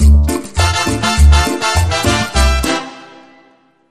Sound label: Sting